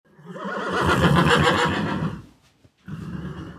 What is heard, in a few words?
horse; brainrot; eco cavalo